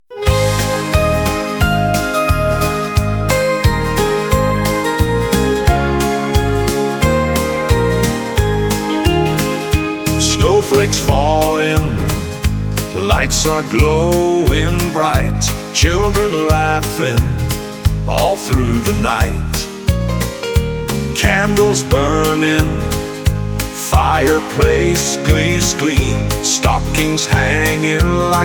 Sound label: Christmas Chimes